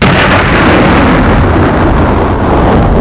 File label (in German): Abriss